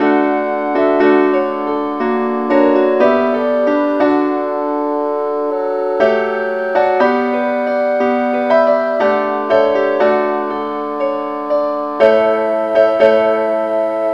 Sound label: keyboard key g